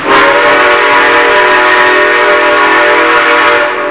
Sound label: chime